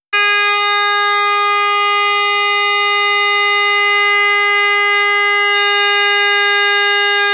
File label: stereo, replacement horns